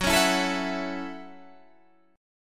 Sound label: A 6th, F sharp, chord